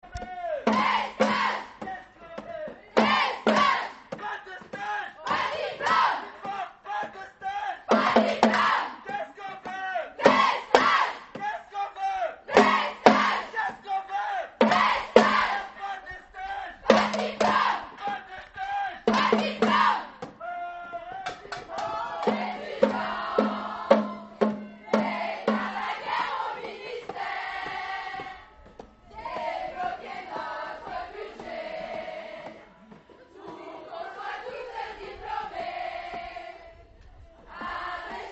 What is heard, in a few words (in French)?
Son chants